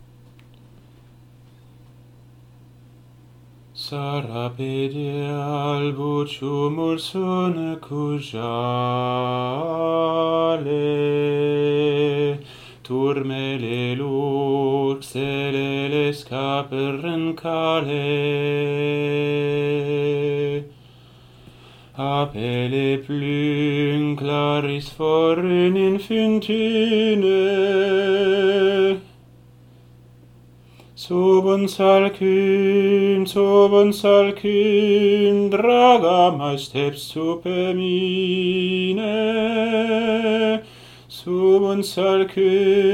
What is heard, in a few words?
sara pe deal alti